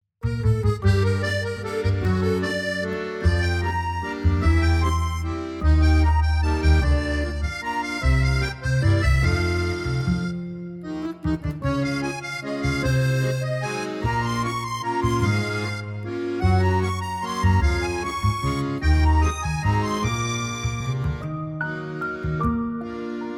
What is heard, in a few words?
French Accordion Waltz